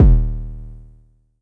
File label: Low Kicks D#1; LIMAT Div Dept; Drums